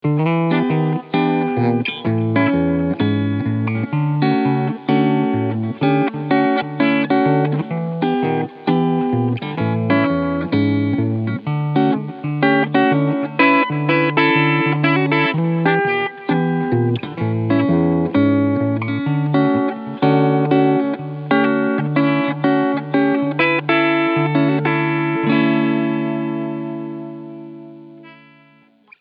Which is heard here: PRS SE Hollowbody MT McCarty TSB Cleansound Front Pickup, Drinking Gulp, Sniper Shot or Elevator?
PRS SE Hollowbody MT McCarty TSB Cleansound Front Pickup